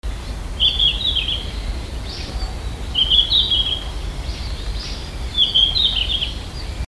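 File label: ruribitaki, red-flanked bluetail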